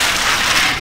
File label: Crash; 特朗普; ALS冰桶挑战